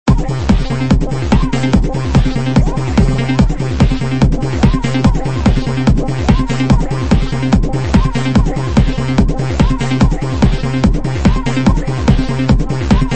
Ethereal Electronics; Touch The Universe; Omnisphere 2

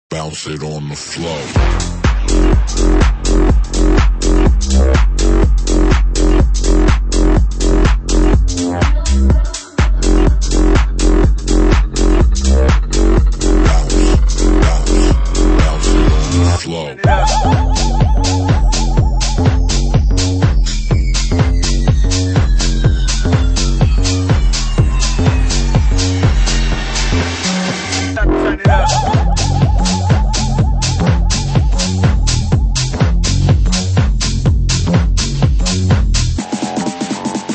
Bass house; ANA 2 Presets Vol 10

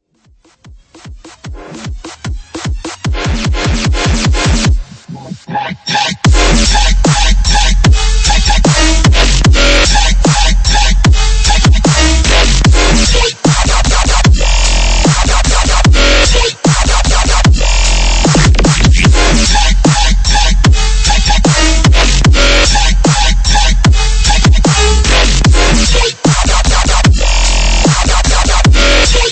dubstep; Cymatics Dubstep Toolkit

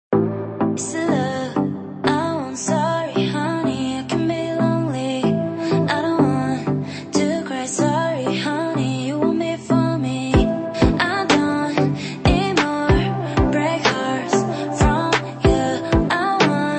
Tropical Reggaeton

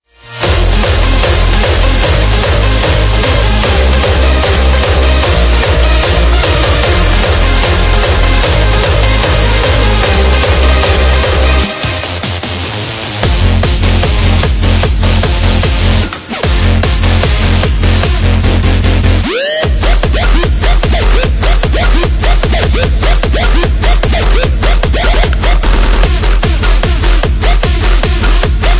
Hardstyle; Fragment Audio Hardstyle for Serum V.2

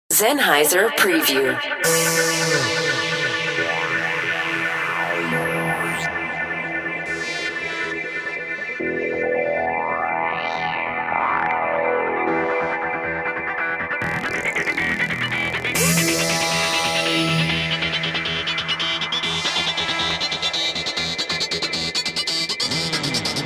Psytrance; Zenhiser Omnisphere Psytrance Presets

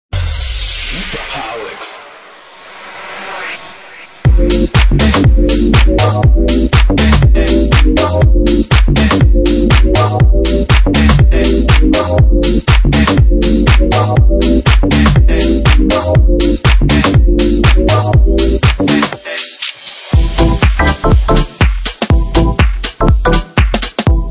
Deep House; Loopoholics Deep House Selection

